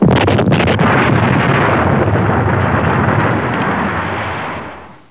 explod; duke nukem total meltdown

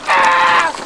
burn die; Army Men TiS

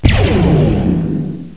Zap; The Ultimate Guide to Mortal Kombat

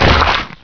pulp

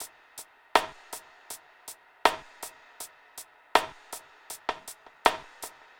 DRUM LOOP ambient 160

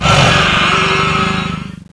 large alien die; Army Men TiS

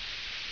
Spray; Kid Pix Demo

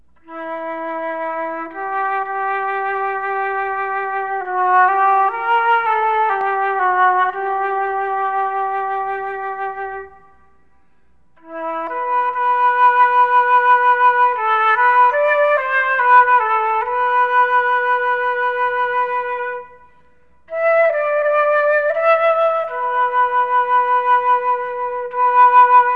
flute; SoftKey Entertainment Pack (Games and Multimedia)

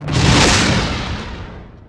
explosive missile; Earth and Beyond; EA Games

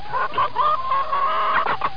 chicken; Precision Software Applications Silver Collection Volume Three

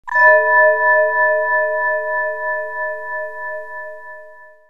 mystery; KABOOM! - Wild and Wacky Sound Software; music; drama

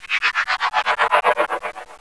ufo; The Best of Select Top 50 Volume 1; wildride

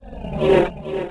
rock hit; DAoC

